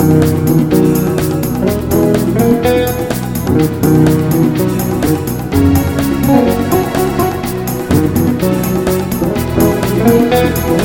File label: Extremely Hot Samba